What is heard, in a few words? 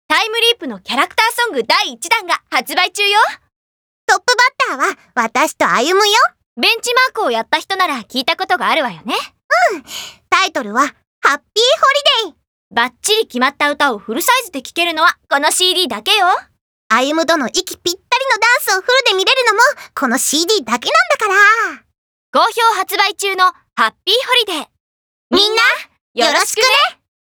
song